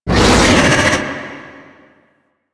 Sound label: Attack Vocals; Ambience; Golden Maw; Warframe; The War Within